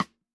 Snare Sidestick, drums; One Shots; YMA

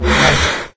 hovercraft whoosh; There; ThereClient

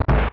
Door Open; Open Zelda; Quest Designer 2.0.4b; Doors

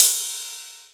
Ride; Drums; Ableton Live 12 Lite Core Library

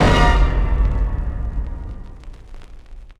Stab Brass Blast; One Shots; Ableton Live 12 Lite; Core Library

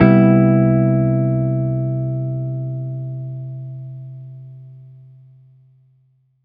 Guitar Jazz CMaj7; Guitar & Plucked

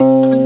Guitar Chop C, FX Hit; Drums; Ableton Live 12 Lite; Core Library

